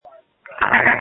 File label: Scream